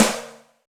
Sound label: Power Snare; Roland BK-5; Snares